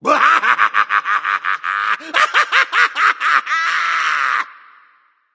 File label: laugh; smite; voicepack; loki